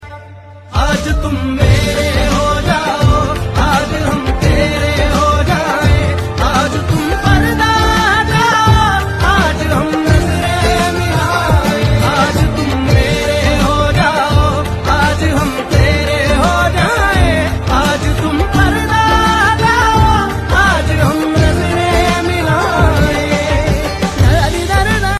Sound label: Ringtone